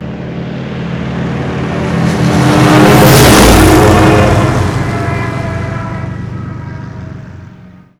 stuka, vehicle; mohaa

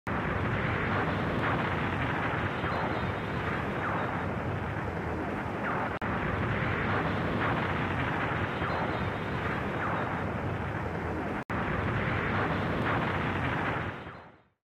music; singe; Venus Wars.daphne

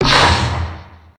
bite; scummvm; The Griffon Legend

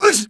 damage; mohaa; dialogue

